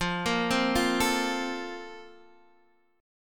A sharp Major 7th Suspended 2nd

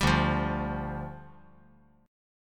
D Minor Double Flat 5th, D, down

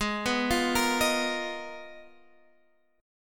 G sharp Minor 6th Add 9th; arpeggiated; 16,14,15,15,16,x

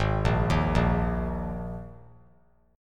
G sharp Suspended 2nd, G sharp, down; G#sus2; arpeggiated; JGuitar

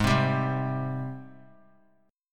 G sharp Major 7th G sharp; 20,19,22,22; JGuitar

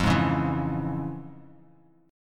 F Minor Major 7th Sharp 5th, F, Bass Standard; strummed; JGuitar

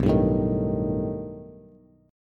B Augmented 7th B down Bass; strummed; JGuitar

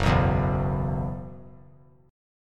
F sharp 7th Suspended 4th; F#7sus4 strummed

